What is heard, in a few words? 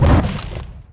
strider step; Half Life 2